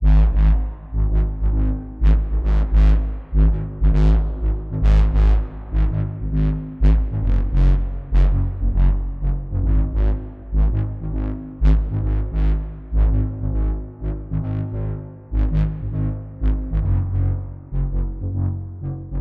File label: Bass